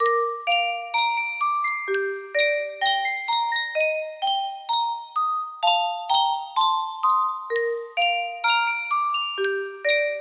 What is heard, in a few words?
yunsheng custom tune music box